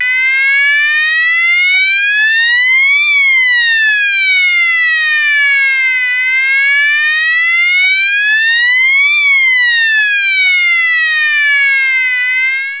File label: Sounder